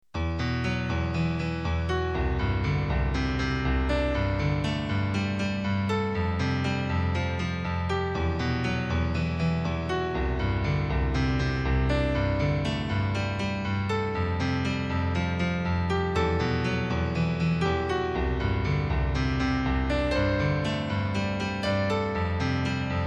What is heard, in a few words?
Serious Piano Interlude